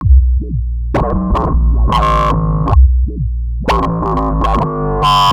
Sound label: Future Bass; Drum & Bass; Fluid Dynamics